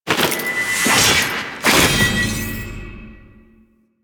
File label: Riposte Finisher Foley; Enemies; Finishers; Drifter; Warframe; Duviri; Dax